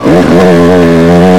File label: throttle low, YAMAHA